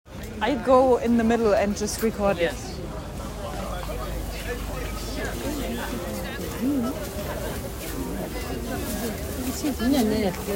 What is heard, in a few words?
market, Rotterdam; Rotterdam Field Recordings